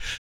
BOOGA BRETH, Vocal Planet Blues & Soul; ILIO

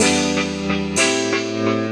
E PIANO 125, Keys; Houseworx

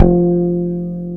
FR BASHAR E3, Bass; AKAI S6000 CD-ROM - Ultimate

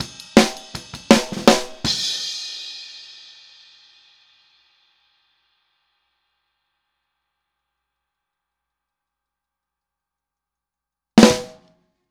Outro Snare, 162 BPM, Drums; Drums from the Big Room The Mixes; Take Your Toms Off

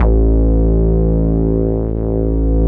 PL MOOG; Wizoo - Powered Nord; STEREO LAYER

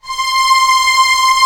STR C6 FF L, STRNGS, Orchestra

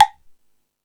Jazz Drums, E-MU Pro-Cussion; drum machine

